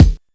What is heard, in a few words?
rich mid range bass drum single hit f key